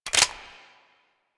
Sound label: AK74 Clip Out; Warframe; Kalashnikov; Reload